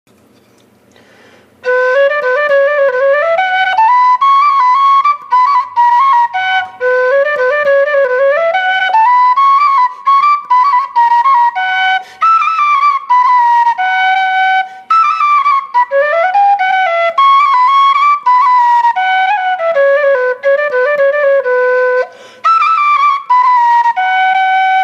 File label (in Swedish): Polska, harjedalspipa; efter Christoffer Tholsson